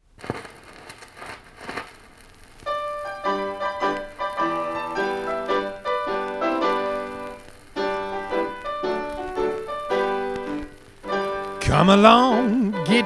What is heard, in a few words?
with vocal and record noise